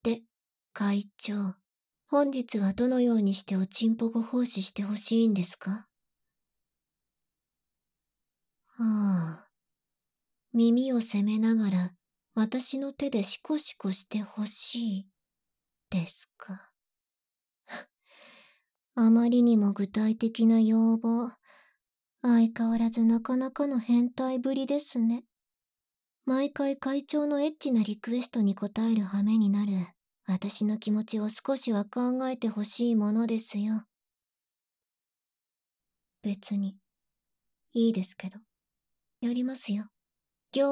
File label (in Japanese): ぐっぽり耳舐め; 手コキでご奉仕; クールな副生徒会長ちゃんの嫌々性徒会業務